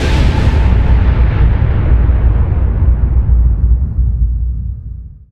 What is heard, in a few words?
NOISE, Production Effects